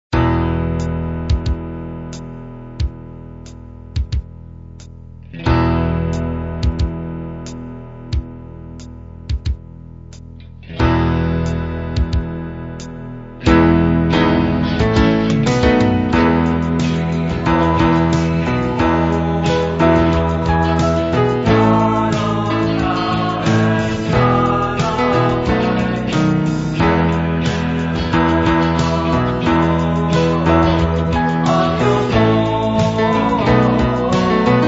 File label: Sanctus Piano